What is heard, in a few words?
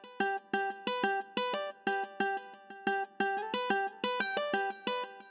new music